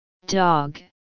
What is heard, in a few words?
dog, farm animals